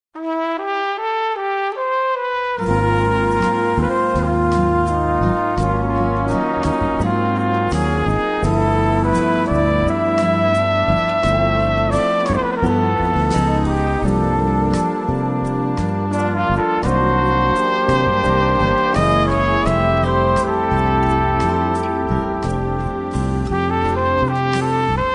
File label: Brass On Ivory